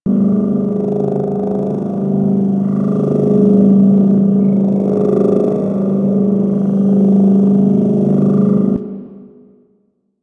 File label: hologram display loop; Warframe; Hub; Tenno Hub